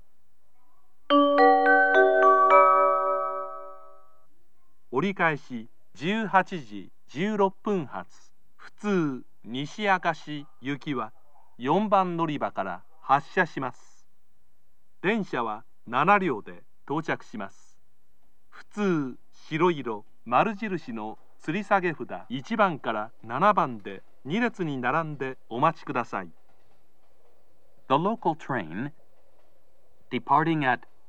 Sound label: kyoto turn local, station; nishi-akashi